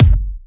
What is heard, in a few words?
Bassdrums